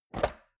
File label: metal box small open; maschinen; soundpool